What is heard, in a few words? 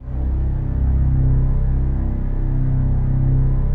PAD; Best Service; XX Large; Pads